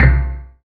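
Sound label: PER PROCU, Procussion, percussion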